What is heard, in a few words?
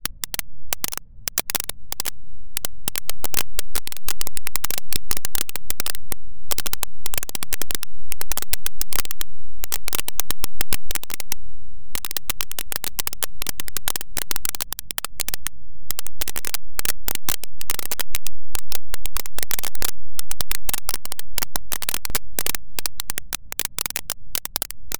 fire